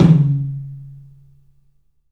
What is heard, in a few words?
TOM, 3-D Percussives; E-MU Producer Series Vol. 5 – 3-D Audio Collection; P.A. Kit